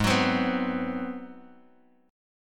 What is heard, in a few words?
G sharp 7th Sharp 9th, Bass Standard; G#7#9; strummed; 16,21,22,16; JGuitar